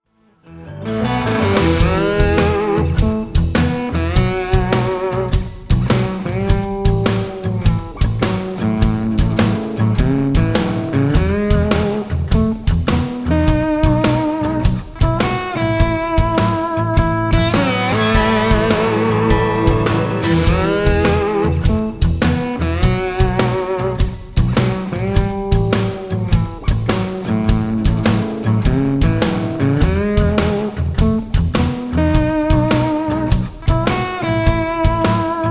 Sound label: boogie shuffle, 4/4 shuffle